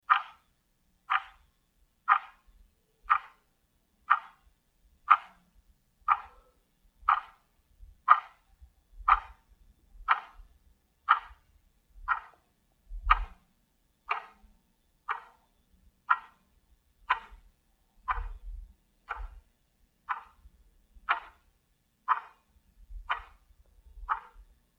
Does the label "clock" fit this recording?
Yes